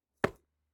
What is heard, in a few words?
Jump To Concrete